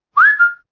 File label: icq message